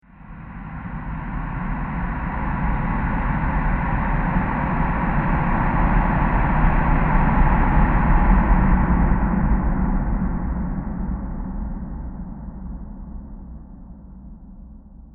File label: Breathy Science Fiction Space Transition; CraftedSignals